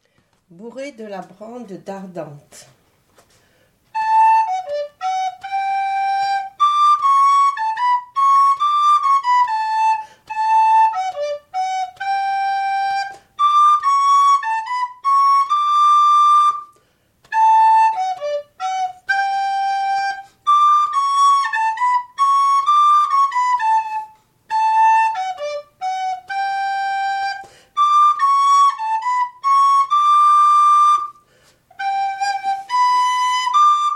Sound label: flute